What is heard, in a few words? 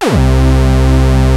TECH KIT, RAVE